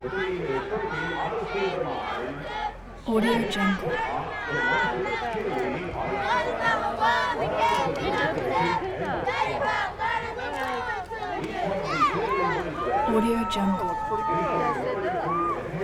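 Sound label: parade cheerleaders distant pa crowd close up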